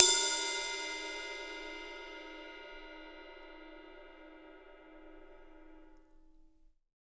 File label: RIDE20HV E; Big Fish Audio; Ross Garfield Drums VOL-2; CYMBALS